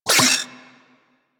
Vocal Pain; Warframe; Corpus; Mini Moa

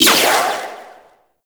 Charged Shot, Auto Cross Bow; Warframe; Weapons; Tenno; Prime Ballistica

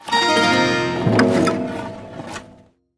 Shawzin Unequip; Warframe; Duviri

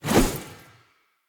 Long Sword Throw; Weapons; Player; Pothia Long Sword; Swords

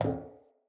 Walk Metal, Footsteps; Characters; Enemy; Mockeries; Mockery

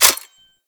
Reload D B; Warframe; Weapons; Zylok Prime

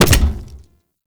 Step Default

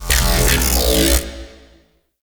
Alt Fire Growl; Weapons; Warframe; Corpus; Tenet Quanta

